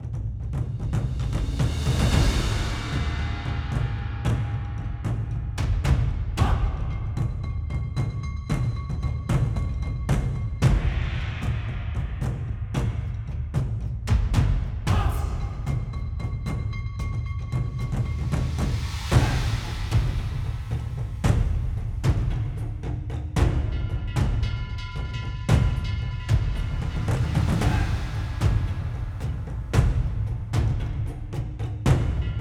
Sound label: bgm lobby arena preparation; Monster Hunter Rise; Xbox Series X/S